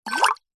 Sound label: drink liquid pour short fast hard glass; food